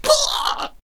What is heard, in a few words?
Banner Bearer Death Vocals